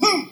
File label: sword swing